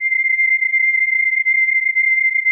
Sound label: VP-330, String Machines